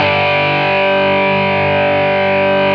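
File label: GTR POWER, Power Chords; Metal Chds; Club-50 - Foundations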